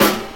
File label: Snare OS; Sonic Foundry; Rondo Brothers Trip-Hop Loops & Samples